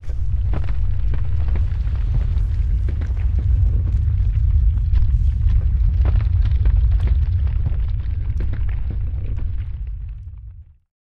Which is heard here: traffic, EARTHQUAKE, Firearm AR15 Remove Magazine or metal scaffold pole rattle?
EARTHQUAKE